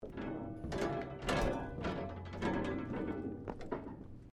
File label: GANG PLANK RATTLE, Sounds onboard HMAS Castlemaine; Boats; Vehicles